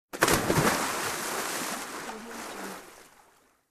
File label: jump into the water